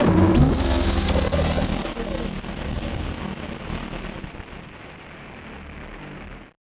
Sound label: Malfo Syn, malfunctioning synth